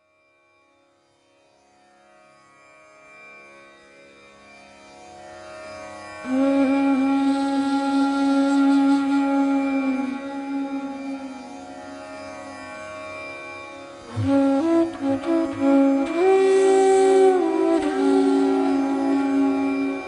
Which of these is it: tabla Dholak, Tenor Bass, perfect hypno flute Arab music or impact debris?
perfect hypno flute Arab music